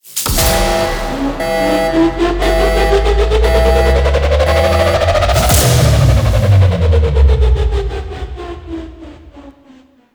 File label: Self Destruct Build And Cancel; Warframe; The War Within; Orbiter Wake Up; Cinematics